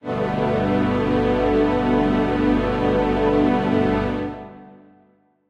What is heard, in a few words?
Strings start